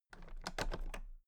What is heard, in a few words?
toolbox latches open very soft